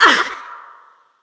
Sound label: Wound Heavy, Creature; Eydis